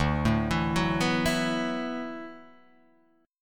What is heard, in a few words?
D 6th Add 9th D, Guitar, Open D